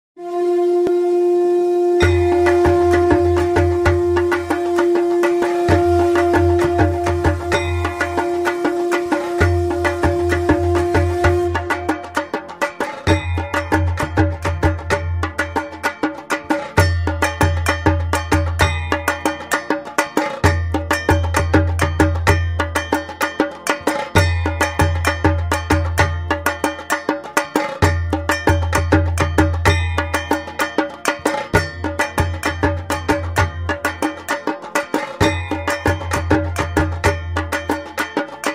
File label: Durga Puja Dhak